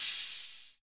long open hi hat sound A# key, open hat one shot tuned to the A# note